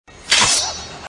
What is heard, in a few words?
claw sound; wolverine